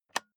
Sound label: office chair lever